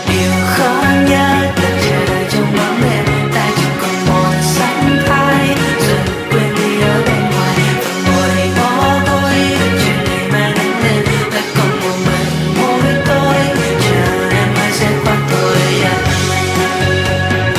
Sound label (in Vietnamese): Nhac Chuong